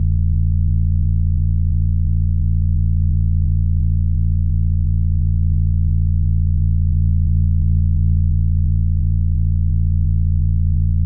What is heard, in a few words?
No Input Feedback loop low deep pulsating clean tonal; Alessio Mellina; No Input Feedbacks